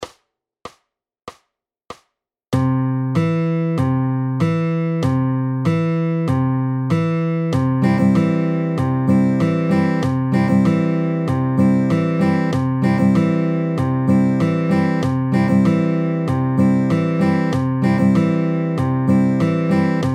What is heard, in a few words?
Slow, fingerpicking patterns